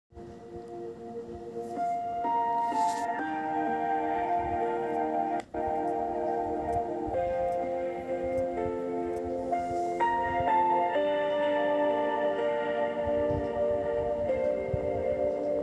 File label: ambient peaceful